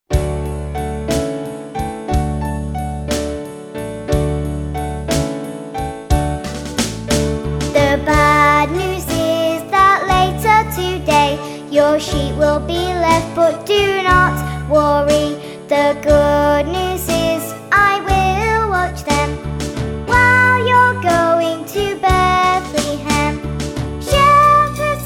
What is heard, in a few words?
more bad news and good news vocals